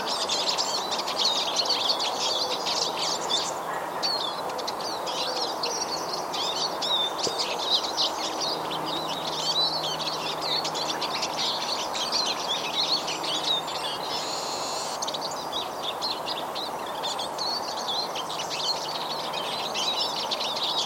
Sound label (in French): tarins des aulnes; 4 haute ile